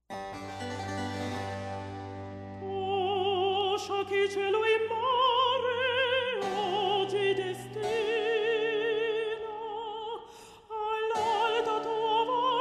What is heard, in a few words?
Opera recitative; la Liberazione di Ruggiero